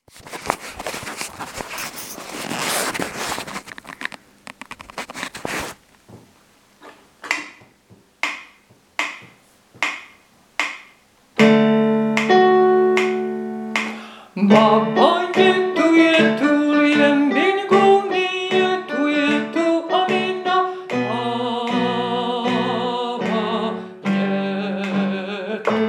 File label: tenor; hostivarsky koncertni sbor